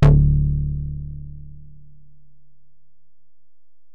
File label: Bell, Alesis DM-PRO